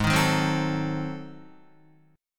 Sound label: G sharp 7th Flat 9th G sharp down Guitar, chord; G#7b9; strummed; JGuitar